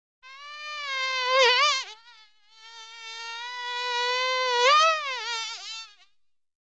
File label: bee buzzing around